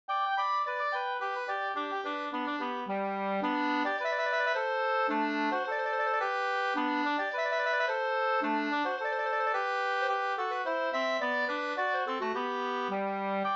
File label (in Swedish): slängpolska